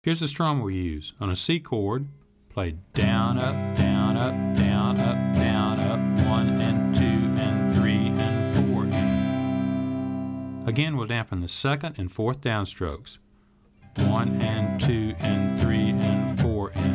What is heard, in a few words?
Muted Strum